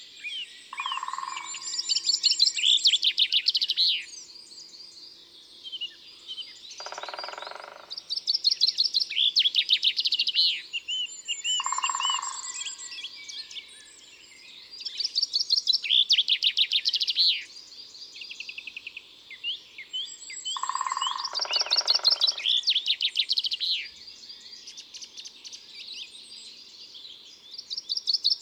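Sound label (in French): Pic epeiche tambourinage, Concerts naturels de l'Isère; Studio Les Trois Becs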